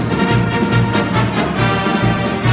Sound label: fight song